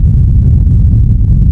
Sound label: Rumble Mono